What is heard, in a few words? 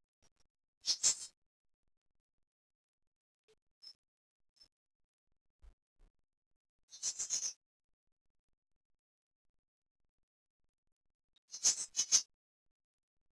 Infant zic, loris